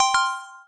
otoyuka

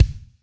marimba drum; ゆめ2っき

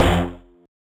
marimba steeldrum; ゆめ2っき

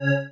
marimba spacesuit; ゆめ2っき

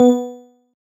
marimba; ゆめ2っき

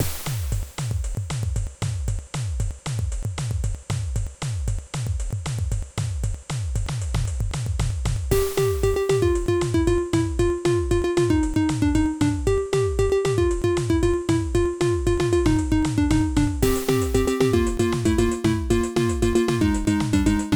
battle theme, Music; ゆめ2っき

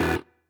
marimba; ゆめ2っき

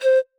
marimba

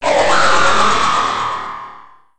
Scream

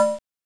Saw C#2

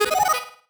marimba dice; ゆめ2っき

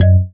marimba raincoat; ゆめ2っき

